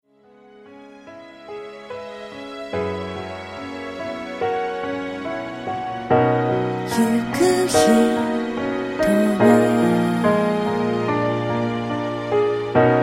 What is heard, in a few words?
vocal